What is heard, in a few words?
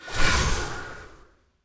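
blood magic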